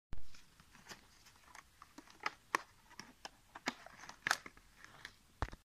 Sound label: wearing gloves; پوشیدن دستکش